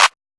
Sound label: Clap; Drum One Shots